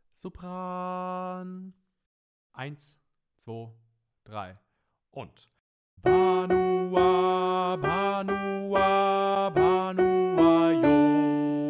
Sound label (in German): Sopran allein; Banuwa